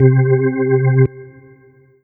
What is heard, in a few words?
Yamaha FS1r Full B3 C3